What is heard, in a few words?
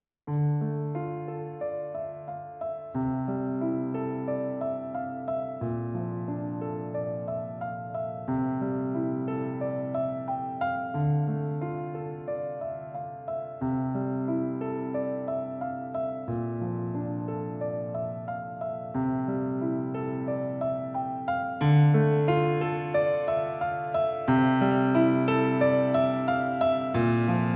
dramatic no drum; by burak sound bank; dramatic dram vol 18